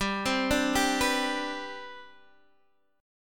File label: C Major 7th Suspended 2nd G, chord